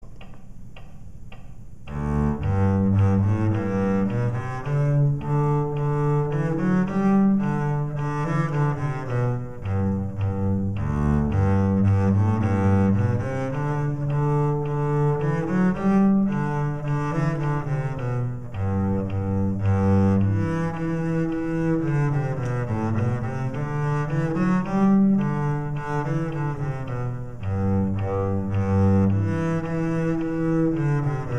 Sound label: english folk song fast bass